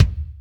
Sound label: kicks